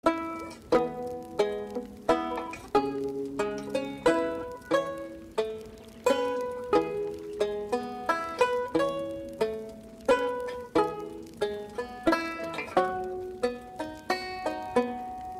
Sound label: Banjo; SoT